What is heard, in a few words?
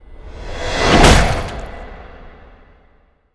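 Dust Golem Attack; Seer; GamigoNA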